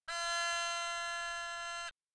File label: haegeum